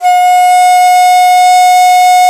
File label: DIZI, Old World Instruments, Winds